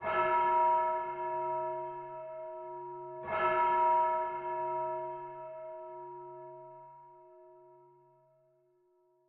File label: bells church bell distant chime; zapsplat